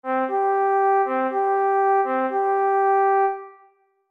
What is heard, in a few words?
shebarim